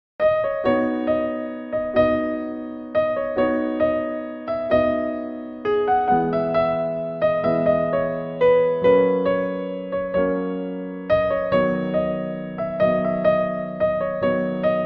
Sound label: piano ringtone